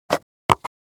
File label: Plastic container open; gfxsounds